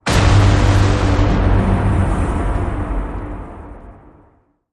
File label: Derangement